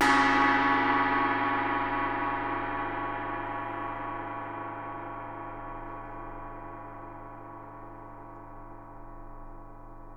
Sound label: GONG, Percussion; Miroslav Vitous - Percussion & Harp